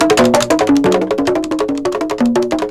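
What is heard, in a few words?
MUKONGO, AFRICAN RHYTHM; Crypton - DrumBase5000; AFRICNRHYTHM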